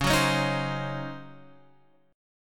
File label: Db Major 7th Suspended 2nd Suspended 4th Db Guitar Open F; strummed